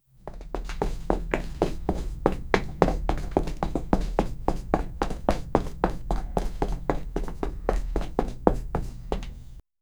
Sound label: people walking towards, footsteps